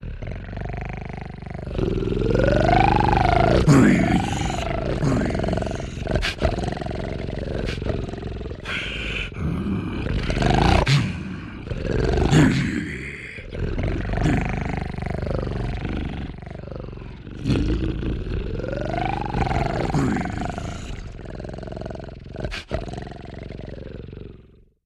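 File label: Devil Dogs Snarling, monsters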